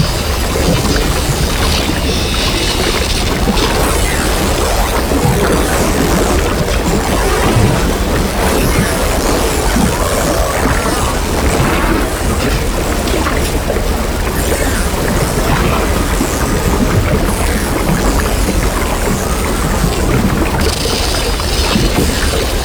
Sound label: Spawn Close Loop; Enemies; Abilities; Warframe; Teralyst